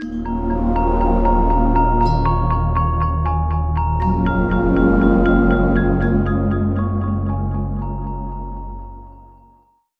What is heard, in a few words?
Short Jingle